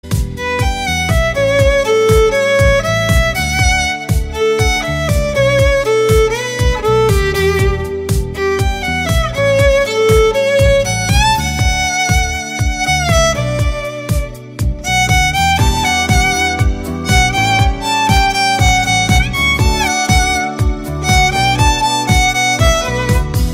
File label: violin